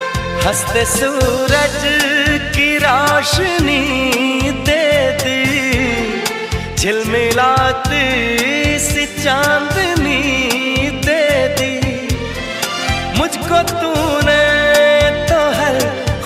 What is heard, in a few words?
Song Ringtone